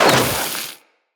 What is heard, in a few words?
Fire Arrow Impact; Level Events; Midrath; Warsong Prologue